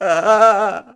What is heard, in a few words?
Desperate; Neighbours From Hell Console Edition; GC, Xbox